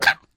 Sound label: Bird Black Damage; fowl